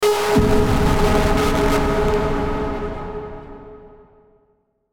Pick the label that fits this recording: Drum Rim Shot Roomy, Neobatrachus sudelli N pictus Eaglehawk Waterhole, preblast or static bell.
static bell